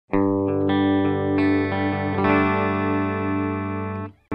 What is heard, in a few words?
Audio-Technica AT Pro37 Clean (Bridge)